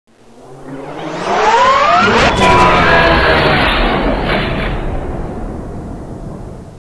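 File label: mothership flyby left; valve; cinima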